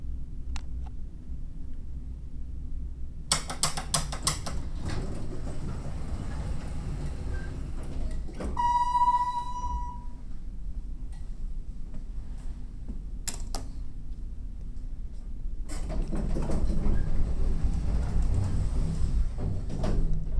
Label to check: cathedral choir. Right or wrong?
Wrong